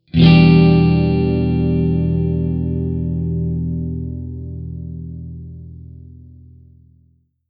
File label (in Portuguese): Acorde de Tetrade de Em7